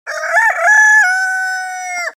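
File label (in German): Junger Hahn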